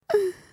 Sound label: woman gasp